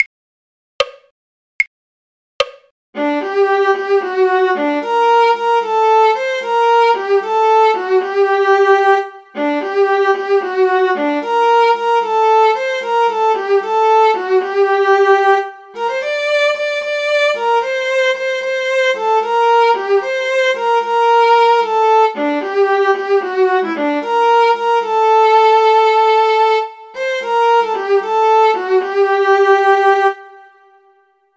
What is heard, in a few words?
viola; The Miller of Dee; folk